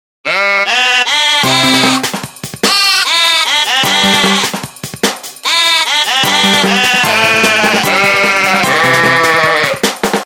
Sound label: baa my sheep